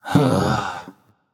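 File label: nonverbal ugh; smite; voicepack; merlin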